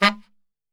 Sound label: G#1 SAX SHOT, Brass Super Section; Best Service